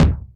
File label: kicks, Bass Drum